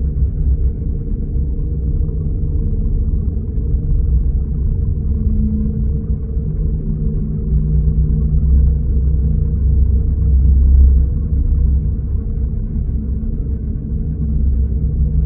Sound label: ambient fallen empire titan idle; Stellaris